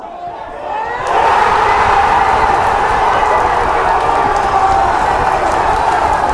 goal, fanchants

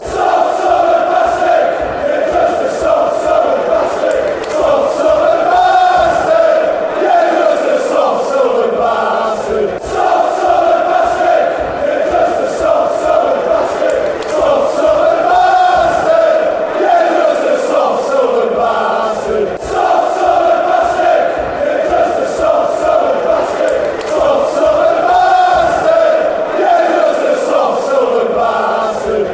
soft southern bstard, chants

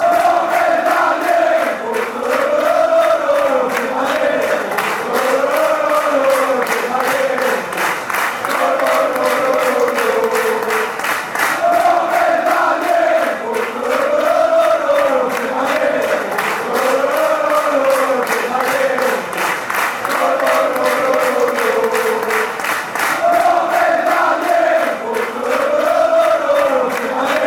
fanchants, football chants, soccer chants; edmilson; real zaragoza; Zaragoza in Aragon

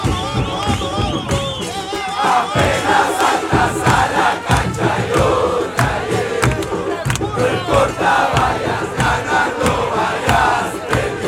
chants

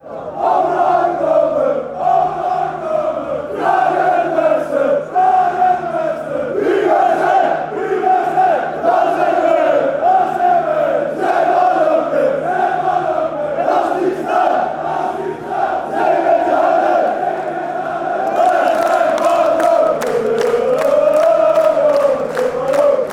zingen, fan chants; lokeren

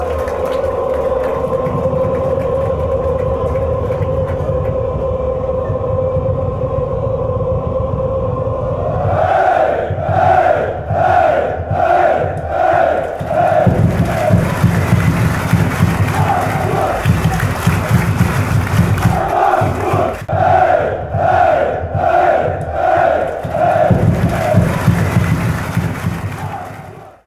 woah hey, fan chants

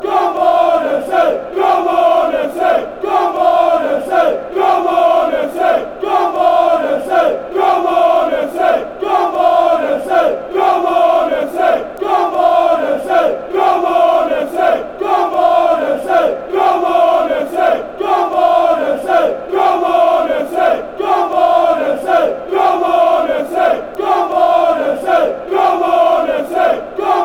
come on, chants

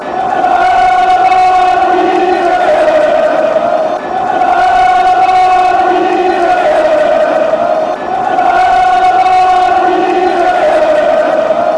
come you bairns, chants

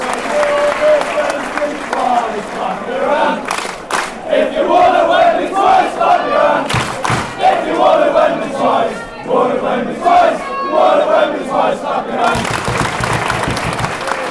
won wembley twice, fan chants

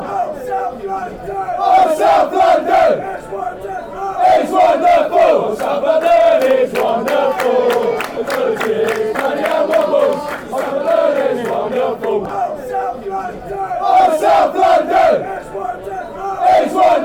oh south london, chants, football song; wimbledon; afc wimbledon

